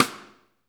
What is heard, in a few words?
SIDESTICKS; Spectrasonics - Backbeat